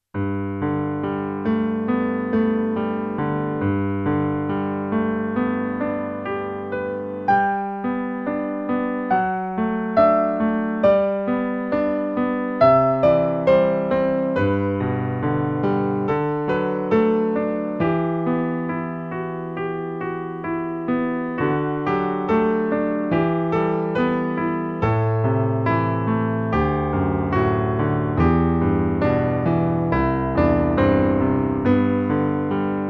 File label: piano